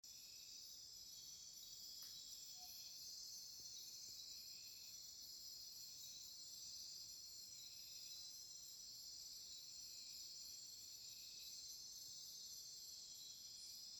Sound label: jungle whispers; Peru; Amazon